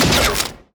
spiders tower cristalize bolt

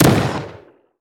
scrap speedster death; enemy

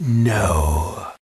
Young Mage Death

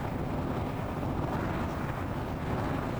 rain Of Fire loop; tenshi

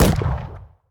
tower demon Pit demon Explosion

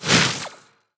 portal close; sfx

